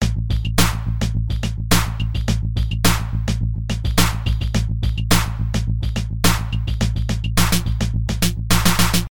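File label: drum melody break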